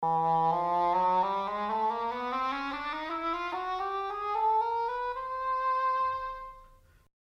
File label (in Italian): corno inglese suono; oboe; strumenti